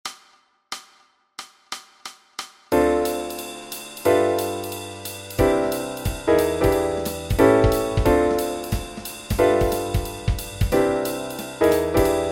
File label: Backing Track, walking bass